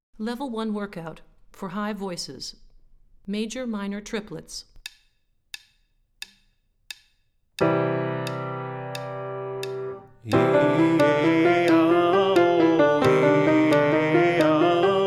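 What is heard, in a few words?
Major Minor Triplets High, vocal warmups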